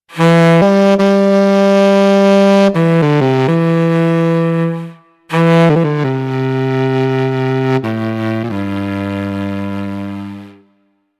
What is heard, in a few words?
sax